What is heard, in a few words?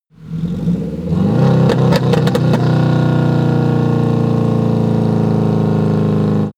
car vw t1 splutter slow down